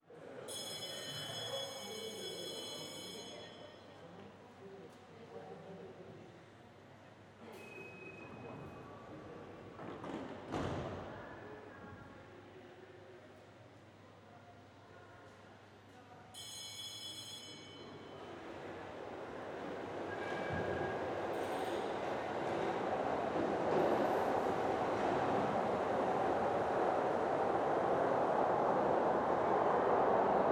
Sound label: Tram Leaving, Undergro; City Sounds